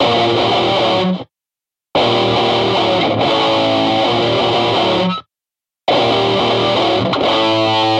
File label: Metal Guitar - one guitar; Recording Metal Guitar; The mono guitar is panned to the middle for this audio example.; Zystrix